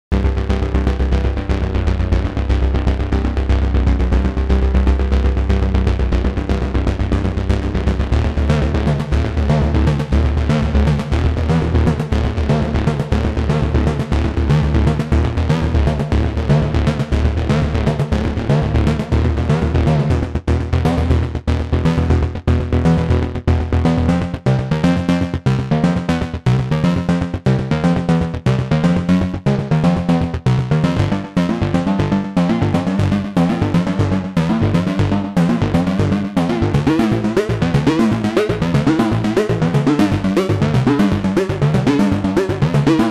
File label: Voltage